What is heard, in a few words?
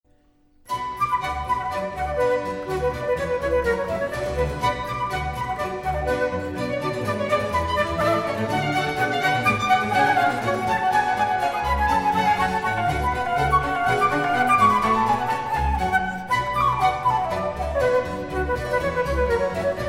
Orchestral Suite in B minor BWV 1067 Badinerie